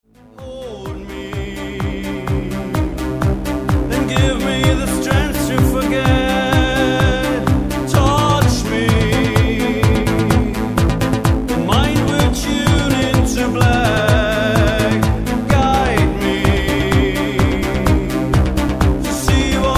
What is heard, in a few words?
follow the drums